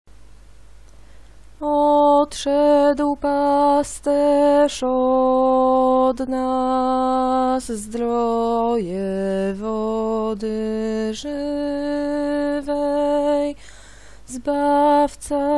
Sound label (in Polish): bas; Wielki Czwartek; spiew na triduum